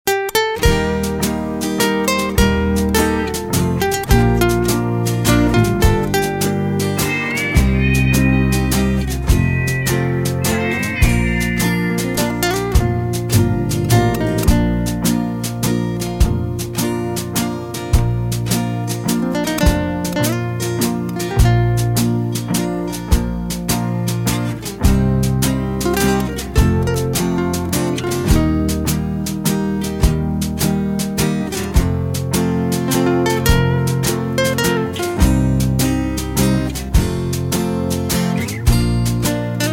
(Bb) No Backing Vocals

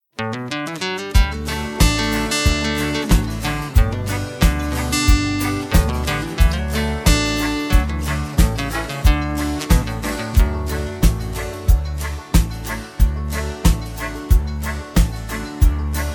(D) No Backing Vocals

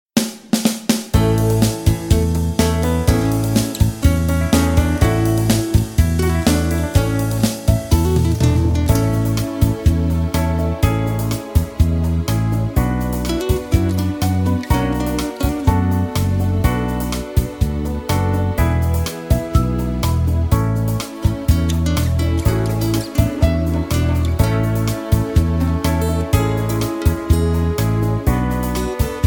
(A To Bb) No Backing Vocals

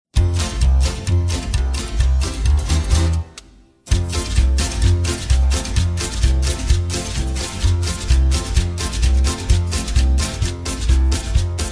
Skiffle Billy Bop, No Backing Vocals

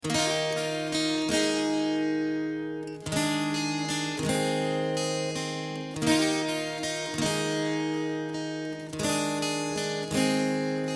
(Eb) No Backing Vocals

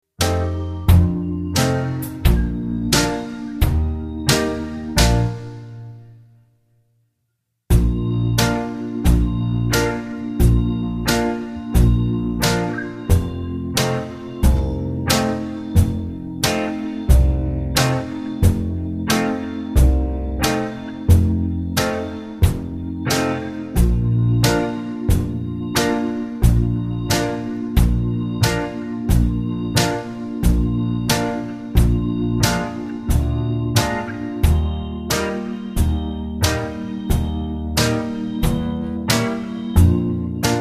Bb No Backing Vocals, backing tracks

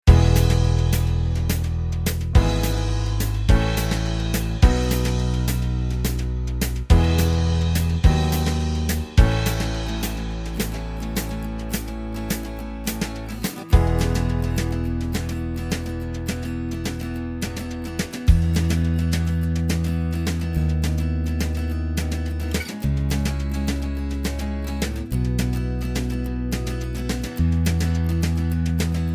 (E) No Backing Vocals

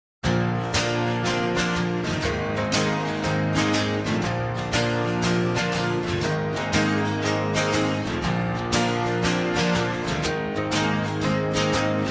No Backing Vocals

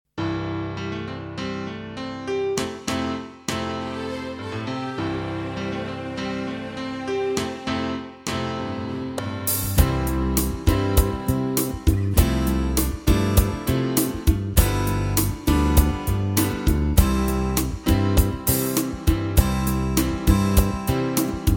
C, backing tracks

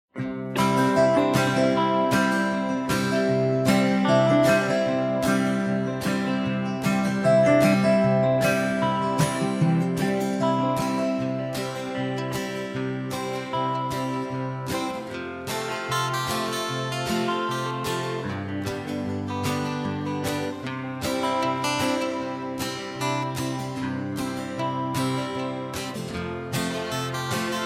No Backing Vocals, (C)

